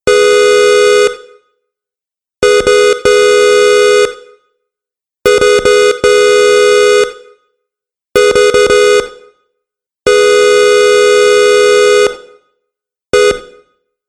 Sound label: Car Horn Selection Pack; Car Sound Effects; vehicle